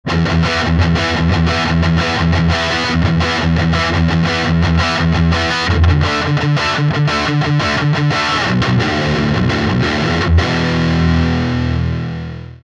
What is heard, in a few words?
les paul voicing; voicing aux médiums très creusés; fender super champ x